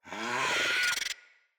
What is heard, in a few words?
Infested Charger Run Breath